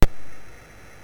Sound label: sid MOS6581R2, GAMES; Face Off